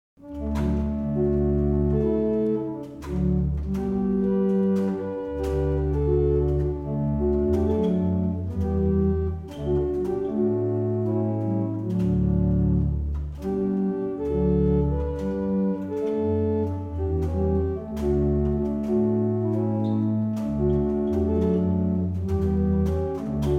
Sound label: Easter Hymn, barrel organs; Witton